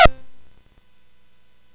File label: CSG8580R5, GAMES; Spikes Peak